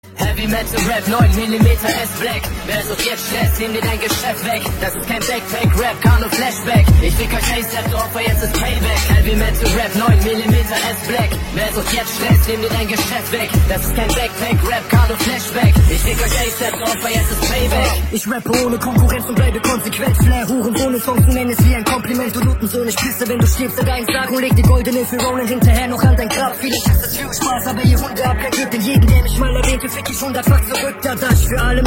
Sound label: Heavy Metal